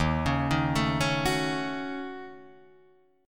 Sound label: D sharp Minor 7th Flat 5th D sharp down Guitar Drop D